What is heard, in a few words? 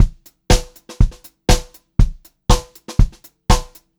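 120 pop variation, Pop-Rock Drumloops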